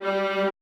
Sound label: G2 R, Orchestra; AKAI Advance Orchestra Upgrade 97 Vol.1